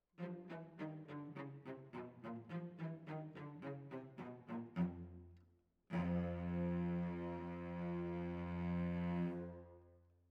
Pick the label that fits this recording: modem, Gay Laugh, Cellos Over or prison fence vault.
Cellos Over